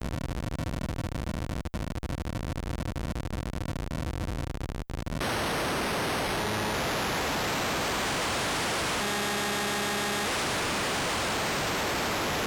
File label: Distort Poly